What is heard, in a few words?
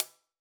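Sab Closed Hat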